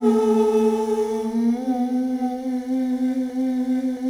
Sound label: mixed ooh; E-MU Formula 4000 Series Vol. 1 - Hip Hop Nation; Vox FX